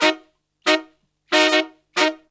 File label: 92 bpm, horns; 90 s Sample CDs; Zero-G; Phantom Horns